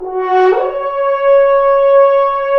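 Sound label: french horns, swells; Roland LCDP06 Brass Sections; F.Horns FX+; FHns Swoops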